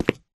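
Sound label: Grunt Movement Footstep Walk Concrete; Titanfall 2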